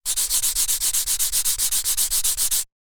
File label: rub